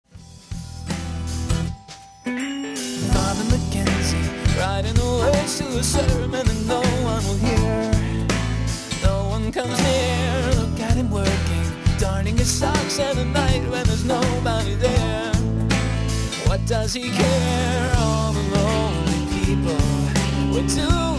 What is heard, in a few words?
Rock Track